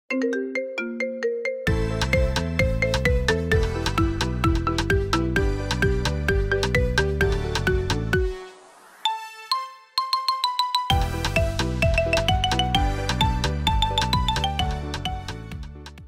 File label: Marimba Remix